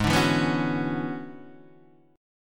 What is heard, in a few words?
E 13th G sharp